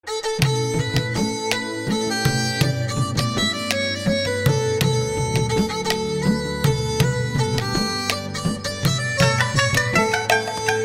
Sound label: Flute BGM